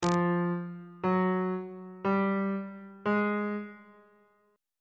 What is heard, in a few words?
b flat 6th interval pitches on bass clef